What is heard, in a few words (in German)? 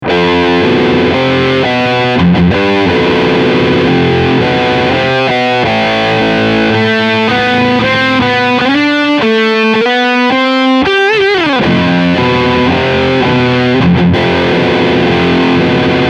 Steg PU Overdrive